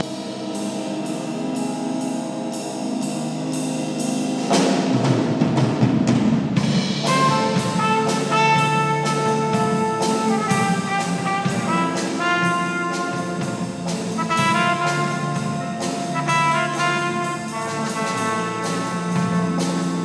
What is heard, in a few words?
very mellow jazz; morecambe